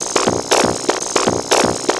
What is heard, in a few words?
drum loops; bb drum loops